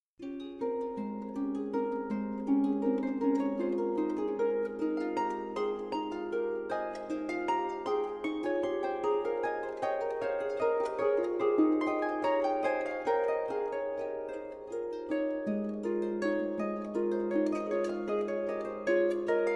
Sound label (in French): Pour La Harpe